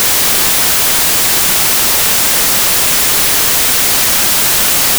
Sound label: white noise